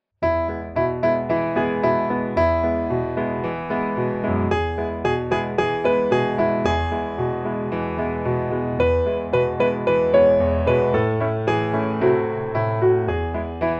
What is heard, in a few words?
piano